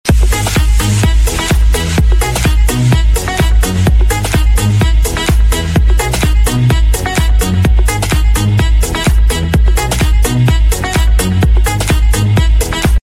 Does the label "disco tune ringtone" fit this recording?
Yes